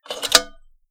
mechanical

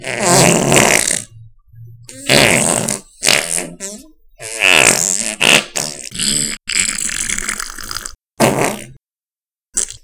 gastric distress

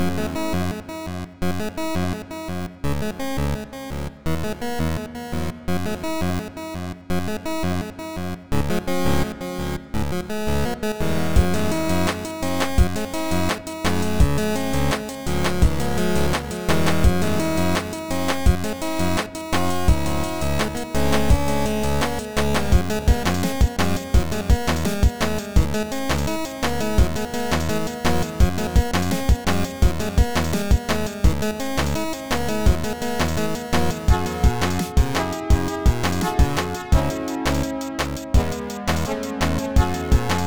delayed chips

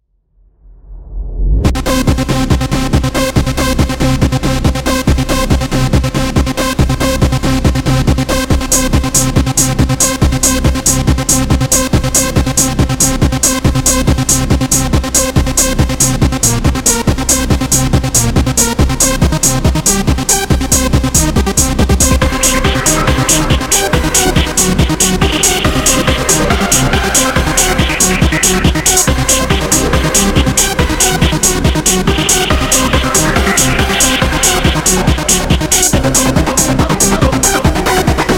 surprise; vintage collection vgm sfx tracks stuff vol ii

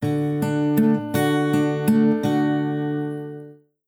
guitar

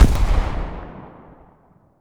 cannon fire

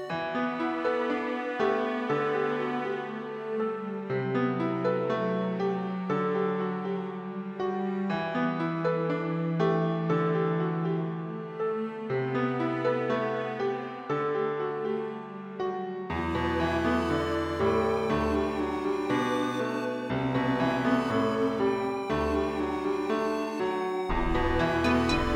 piano; A small Orchestral/retro piece with sad intro and upbeat middle part.; vow to fulfill

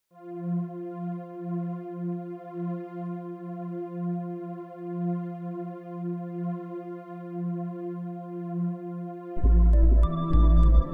deep sea

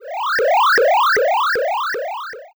charging; chicksword

